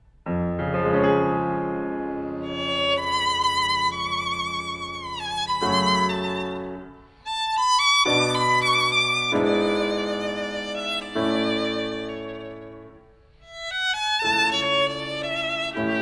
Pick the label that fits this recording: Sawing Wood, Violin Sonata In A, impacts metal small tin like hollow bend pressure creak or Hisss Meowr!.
Violin Sonata In A